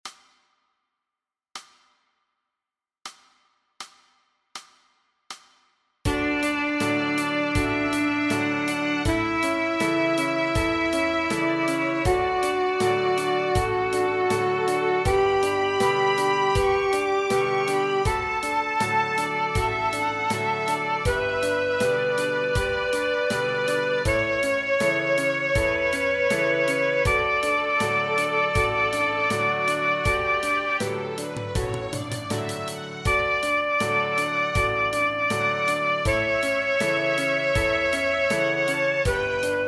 B Scale

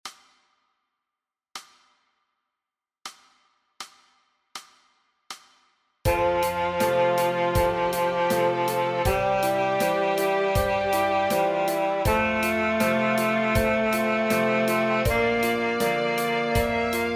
D Scale

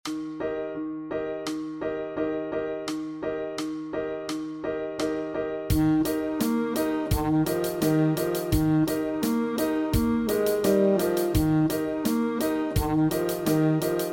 Sax Study

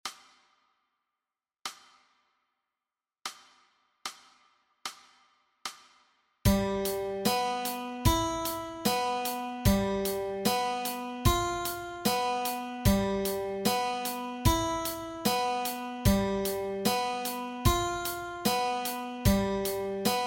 Guitar Exercise

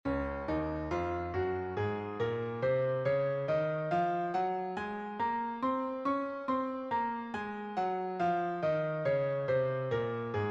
Scale Dflat Two Octaves

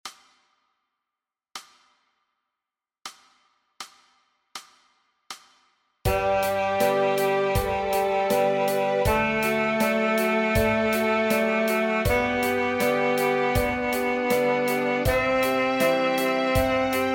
E Scale

